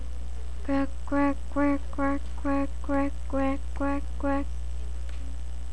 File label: quack